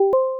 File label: peer-join